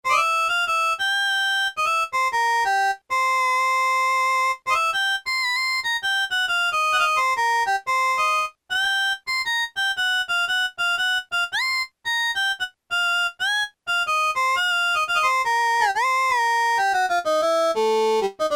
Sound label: HARMONICA, Yamaha SHS-10